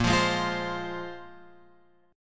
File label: A sharp 7th Suspended 2nd, guitar; A#7sus2 Chord {6 8 6 X 6 8} strummed